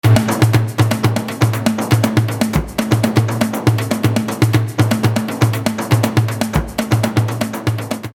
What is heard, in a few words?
Drumloop